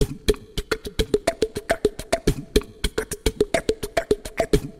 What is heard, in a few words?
Timba Acapella; Timba Sound Effect